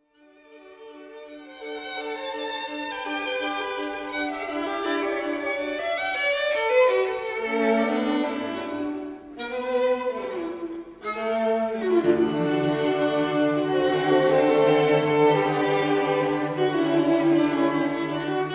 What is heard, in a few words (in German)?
Streichquartett D Dur; Mozart; KV 575